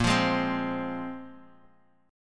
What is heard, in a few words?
Bb 7th Suspended 2nd Bb down Guitar Standard; Bb7sus2 strummed; JGuitar